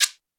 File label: link clicked; qt-webkit-kiosk